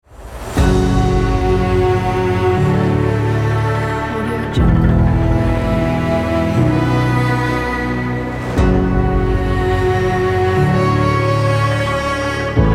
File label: china epic